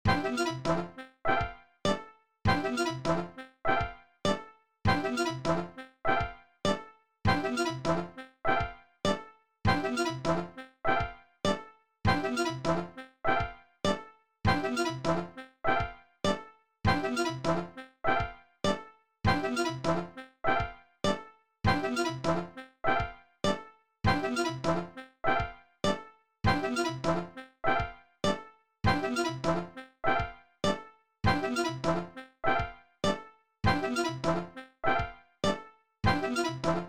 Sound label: hot beat